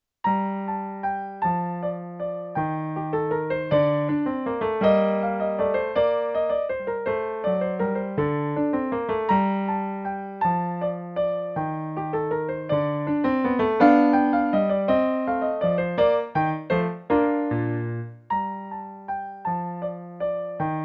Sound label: bach minuet in g minor